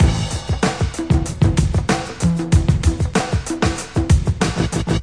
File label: days before rap, drum loops and breaks, 95 bpm; 091 to 100 bpm